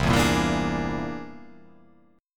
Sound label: C Augmented 9th